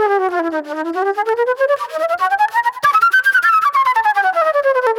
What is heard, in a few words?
Pop Flute